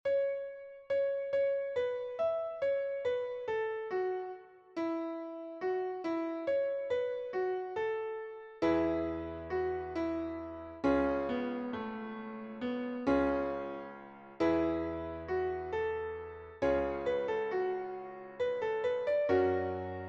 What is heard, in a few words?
Melody